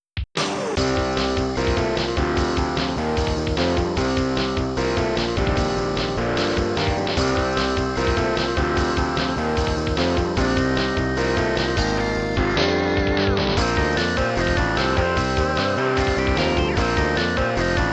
Guitar Challenge; the sims bustin out; gba

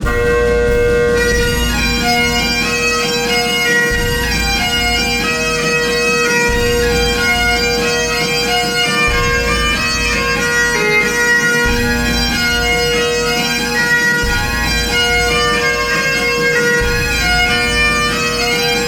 British Win; Day of Defeat - Classic

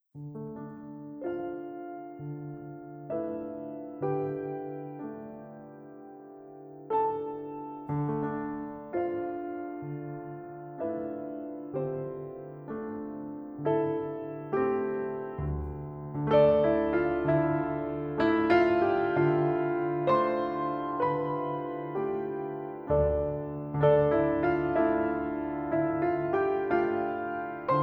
Piano Interlude; NetHack Legacy

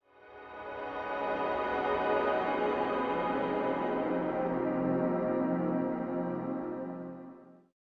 Health Flourish; Insanely Twisted Shadow Planet

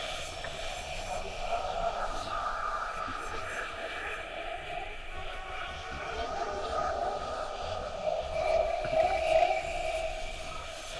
echoes; thief 2014 gamerip

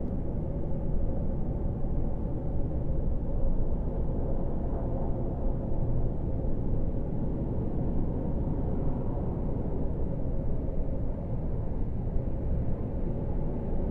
Ambient Secret Room; silent hill homecoming 2008 gamerip pc ps3 x360

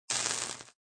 Lightning Spawn

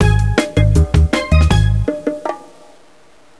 Jungle Win; Magnetic Joe; DSiWare; NDS

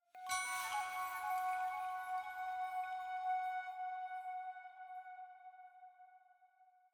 item Jingle Flute + Chime F sharp

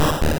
Stomp; Pokemon; Gen 1; attack moves; RBY

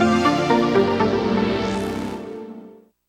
Secondary Item Acquisition Fanfare

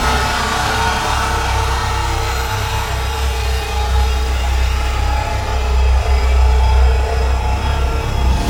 attack

